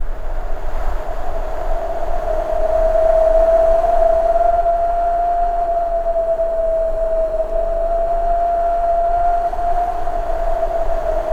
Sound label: WIND HOWL, VOCAL WIND; ILIO; Vocal Planet; Jazz & FX